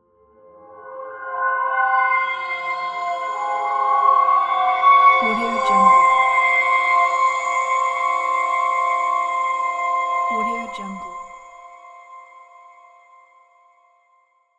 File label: ambient howling noise